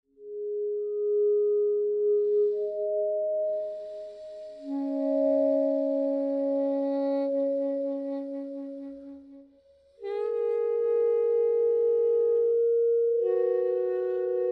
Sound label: Pads and Toy Flute; Mood 2